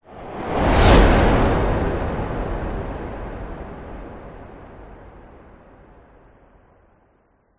whoosh; orlando lanzini

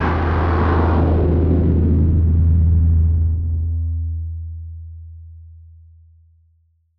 Hard Worker; martyn stonehouse

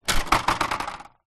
office printer compartment cover open drop

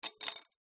wood sticks skewers x2 drop wood surface

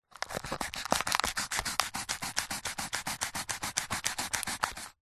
household plastic disposable cup cut with knife; zapsplat

